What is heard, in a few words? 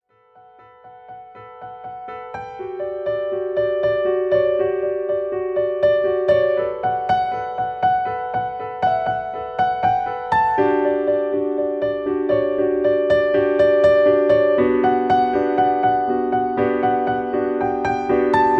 Piano